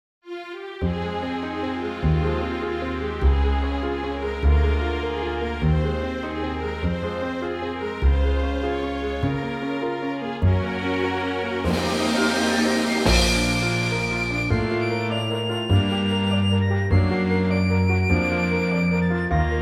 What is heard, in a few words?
Orchestra